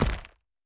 Rock Impact

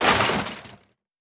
Wood; Survival Game Sounds - Lite Edition; Environment

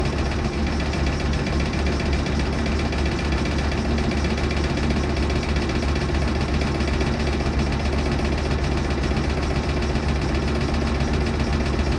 Engine - Loop; Survival Game Sounds - Lite Edition

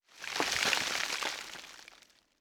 Rock Large Debris; Survival Game Sounds - Lite Edition; Environment

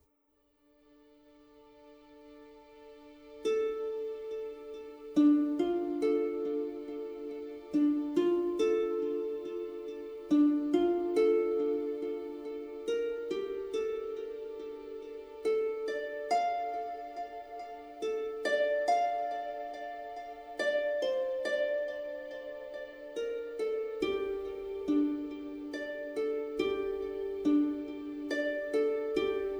Light Ambience, Fantasy RPG Tracks; Free 25 Fantasy RPG Tracks Vol. 1

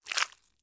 Blood & Gore; Survival Game Sounds - Lite Edition; Combat and Hunting

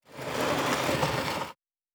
Wood Move; Survival Game Sounds - Lite Edition; Environment

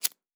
Lighter; Survival Game Sounds - Lite Edition

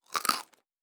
Food Eat; Survival Game Sounds - Lite Edition

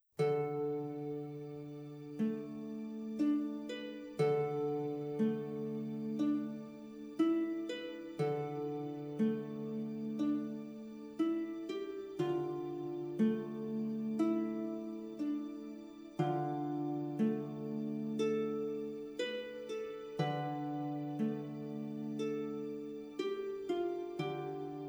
Light Ambience, Fantasy RPG Tracks; Free 25 Fantasy RPG Tracks Vol. 1

